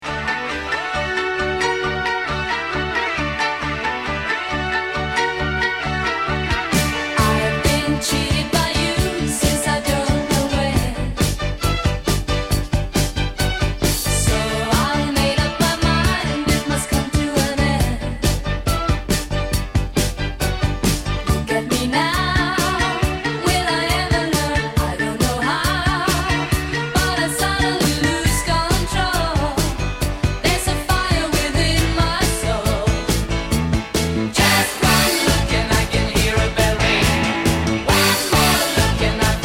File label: Vocal Guide Track